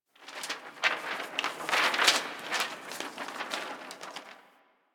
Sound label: Paper Blowing A; Warframe; Ambience; Grineer; Carnival